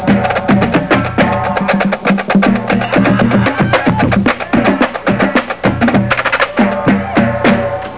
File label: Night Streets- Busy snares.